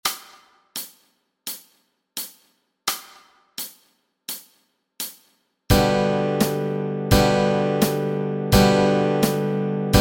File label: Chords Track, Easy Guitar